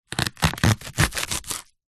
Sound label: leek slice knife on wooden chopping board; food